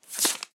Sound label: wood tile pickup